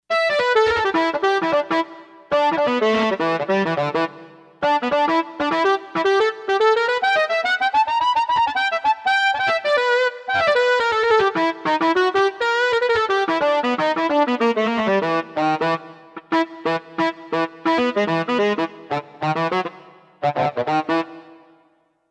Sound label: DRIVE TENOR, Roland SC-880; Factory Patches